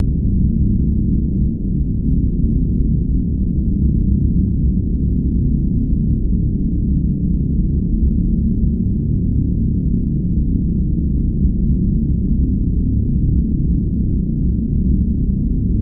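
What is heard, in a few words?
Starship Prophet Turbine Synth; Starship 2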